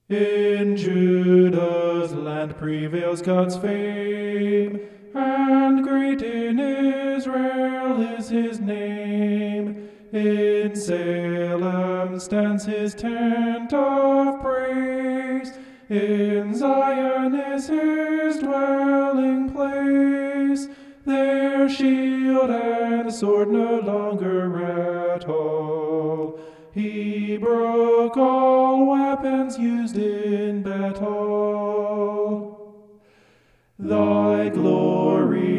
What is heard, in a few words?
vocal homoph; psalm 76